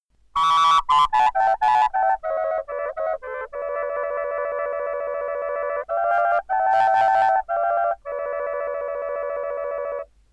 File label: soprano chorus